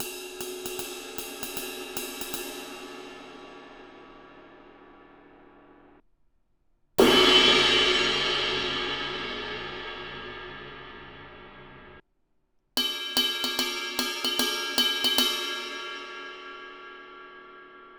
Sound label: 22 inch K Constantinople medium thin ride low ride crash bell, cymbal; Zildjian